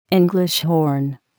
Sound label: English horn